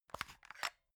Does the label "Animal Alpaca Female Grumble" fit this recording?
No